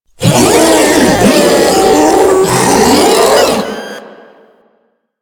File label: Proto Infested Ancient Tentacle Swing Scream; Abilities